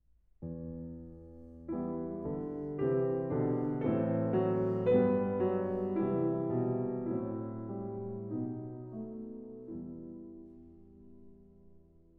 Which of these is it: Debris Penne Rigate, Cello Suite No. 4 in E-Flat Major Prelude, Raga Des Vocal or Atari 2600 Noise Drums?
Cello Suite No. 4 in E-Flat Major Prelude